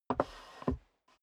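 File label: step dress boots wood creak scuff, footsteps; schritte; menschen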